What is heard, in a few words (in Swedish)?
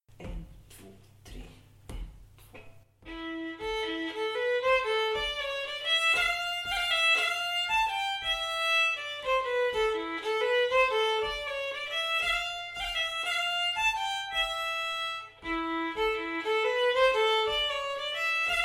Vals i a-moll; efter Hampus August, Västerbyn, Stjärnsund